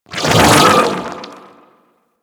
Amalgam Override Attack Dash; Warframe

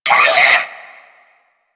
Sentient Brachiolyst Pain; Enemies; Barks

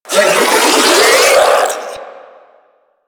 Melee Mid Spin Scream; Warframe; Infested; Ancient

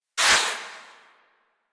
Full Draw Tail, Weapons; Warframe; Infested; Inf Cernos

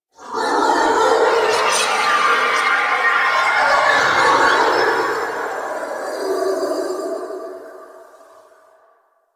Tower Whisper; Warframe; Quests; TheNewWar; Ambience; Cetus; Unum Tower

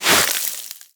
Sword Elemental Ice Whoosh; Warframe; Teshin; Weapons

